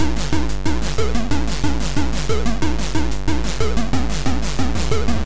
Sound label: BEAT